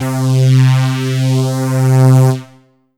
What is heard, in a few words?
Yamaha TG500 BR Tooth C3